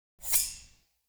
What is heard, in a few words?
UI XP Gain; Hud UI